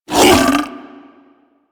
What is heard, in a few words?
Feral Vocal Leg Let Go; Warframe; Kubrow